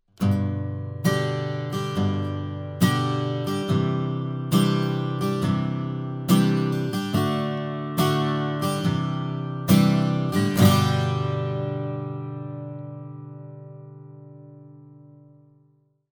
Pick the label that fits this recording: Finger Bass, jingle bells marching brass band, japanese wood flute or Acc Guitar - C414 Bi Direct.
Acc Guitar - C414 Bi Direct